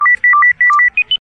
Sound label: B5 door chime